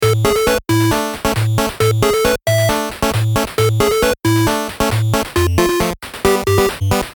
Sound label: Short 8Bit